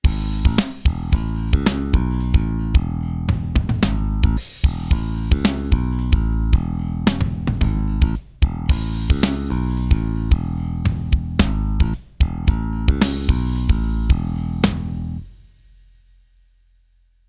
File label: Bass Cut Kick Boost